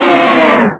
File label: Bowser Roar; Brawl